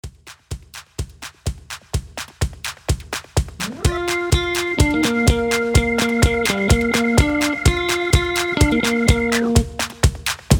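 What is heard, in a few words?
Instrumental with Melody No background vocals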